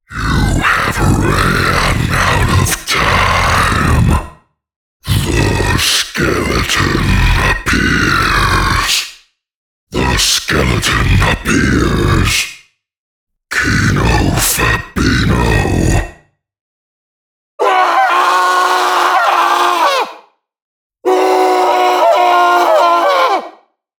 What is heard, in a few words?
skeleton appears